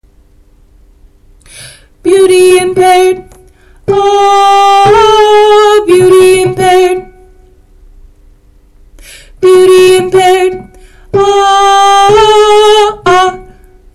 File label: altos